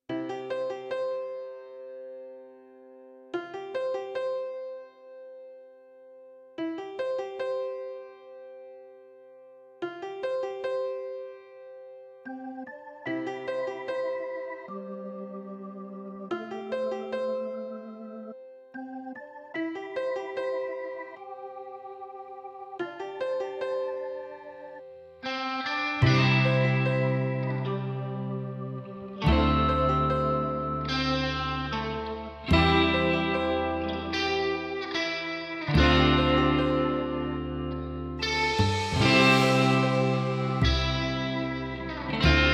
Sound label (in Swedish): gitarrmix